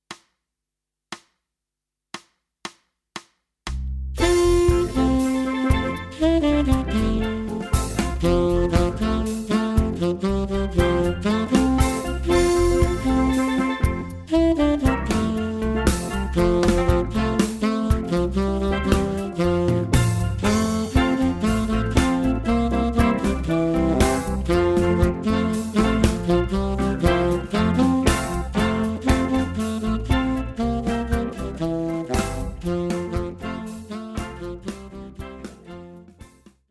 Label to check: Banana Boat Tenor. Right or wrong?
Right